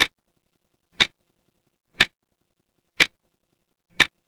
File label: mechanical clock tick